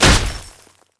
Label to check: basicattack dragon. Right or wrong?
Right